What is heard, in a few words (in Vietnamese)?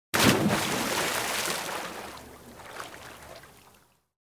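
Âm thanh một tảng đá lớn rơi xuống nước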